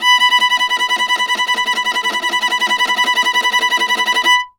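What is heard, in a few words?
VIOLA tremolo B4; Orchestral; DirectWave; Varazdin Orchestral; Viola II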